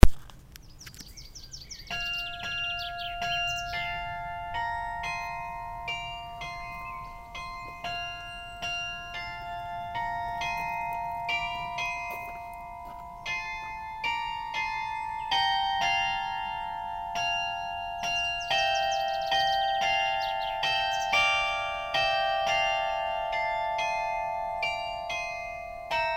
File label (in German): glockenspiel kurz, Rauthaus spielt; Fuerther Rauthaus